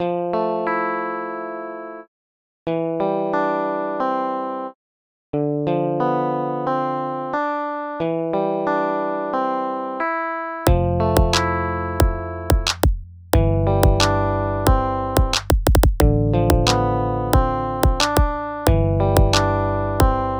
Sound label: Start (Instrumental)